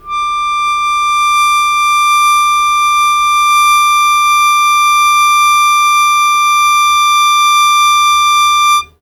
Long Sustain Aa D3, Harmonica; Zero G - Harmonica

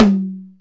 MID TOM; Greytsounds VOL-1; SDX TOMS

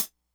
hihat; Big Fish Audio; Hardcore Breakbeat Action; B Files

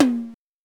Oberheim DX

